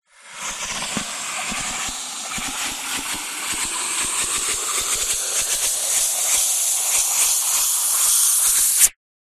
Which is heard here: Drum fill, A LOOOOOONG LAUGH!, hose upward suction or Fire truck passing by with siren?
hose upward suction